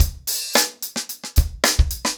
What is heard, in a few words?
110BPM, acoustic drums; The Roots Drumloops